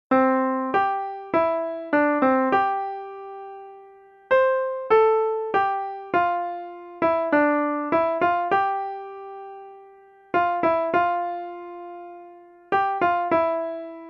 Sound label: Loeillet Sonata, trompete